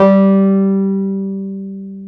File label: PNO, Wurly